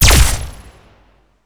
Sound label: alien blaster fire; weapons